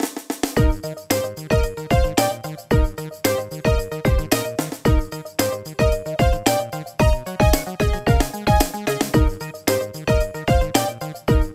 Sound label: Level Up